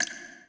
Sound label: drip; school; Tony Hawk's Project 8; XBOX; inschool